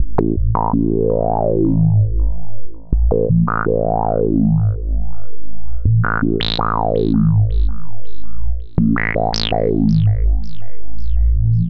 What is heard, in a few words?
Synth Bass